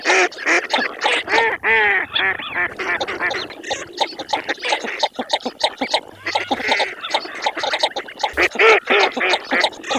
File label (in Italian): MESTOLONI